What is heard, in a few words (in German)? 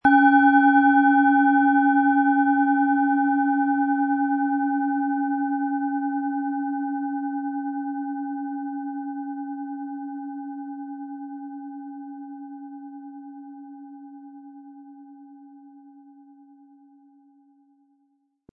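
Planetenschale Mars mit Klöppel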